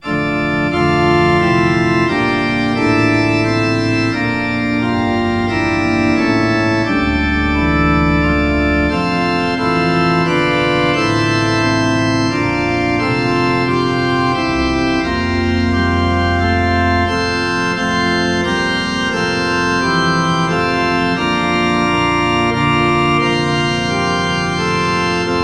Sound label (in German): o heiland reiss den himmel auf orgel choral